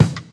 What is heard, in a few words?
clean kick drum single hit e key; kick drum samples